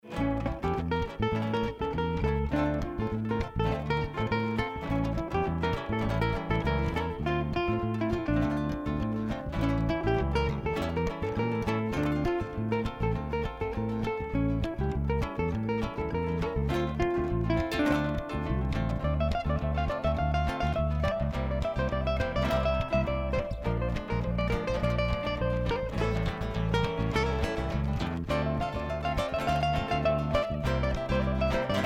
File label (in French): Rumba gitane